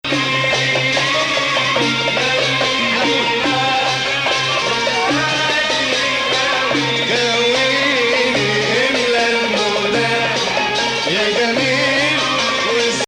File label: Bayati Nahawand